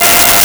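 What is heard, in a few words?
Soprano Sax F5; Nintendo; July 2020 Gigaleak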